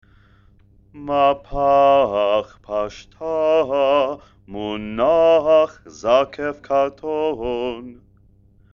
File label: haftarah, trope